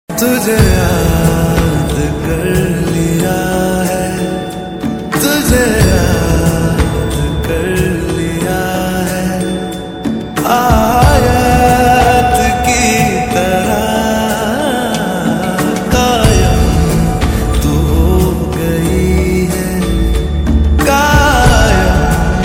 lofi remix hindi ringtone

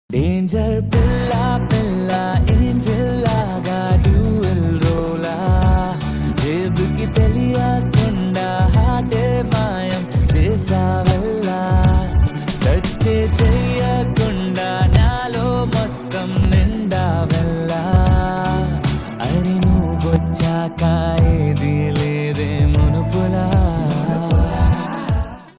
telugu ringtone

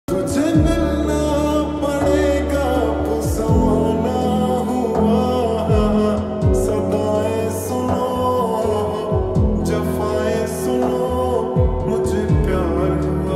lofi remix ringtone

